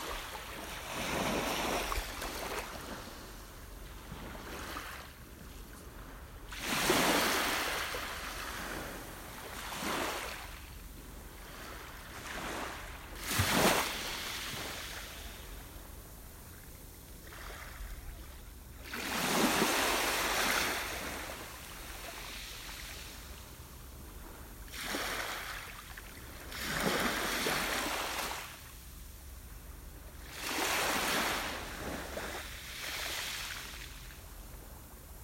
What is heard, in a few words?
ocean calm waters